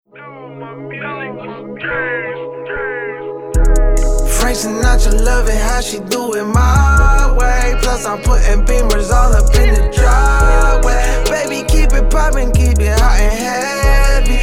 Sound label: HIP HOP II